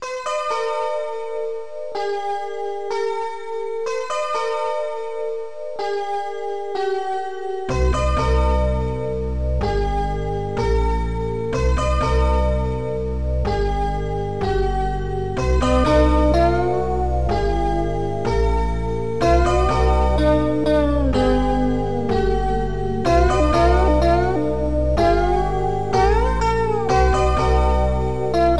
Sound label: simple guitar